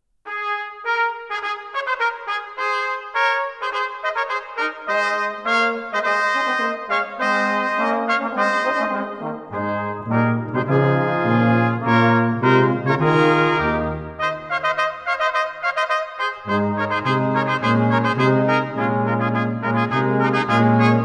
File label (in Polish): Fanfara